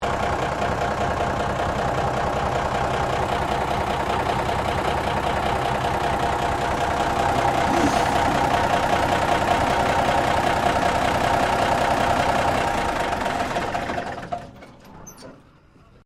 Truck Military M816 Wrecker Idle Shutdown, Tank Transport vehicle; Trucks; Vehicles